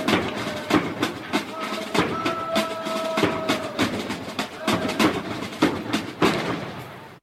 Military Cadence

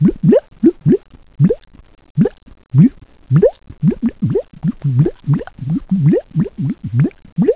bubbles

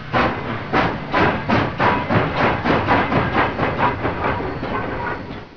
chug chug

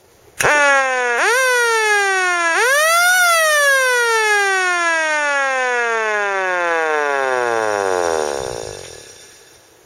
Siren

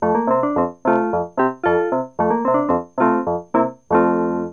Merry Go Round

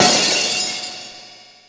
Crash

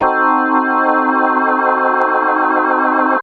organ chord

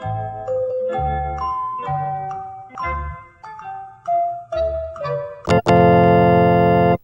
tada; Jeopardy

